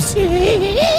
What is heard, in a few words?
CATS EAU EAU EAU